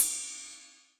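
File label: Cymbal Dark Ride, Drum Machines, Alesis D4; 300 Drum Machines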